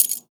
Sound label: Foley